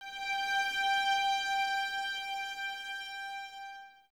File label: VNS G 5, Violins, String Ensembles; Miroslav Vitous